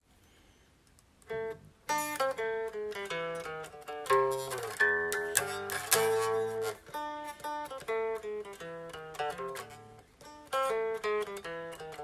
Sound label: Gitarrero C